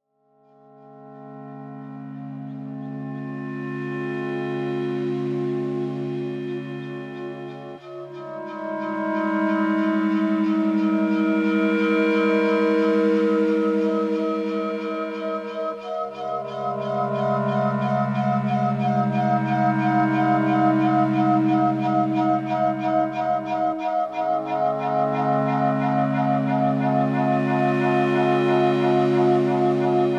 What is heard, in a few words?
Pulse